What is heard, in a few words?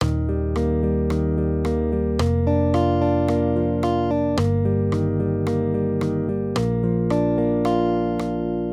maj triads; accompaniment